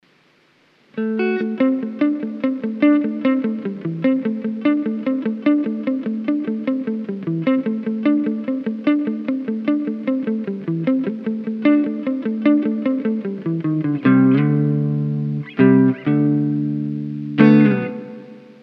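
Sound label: flanger, Custom Guitar Pedal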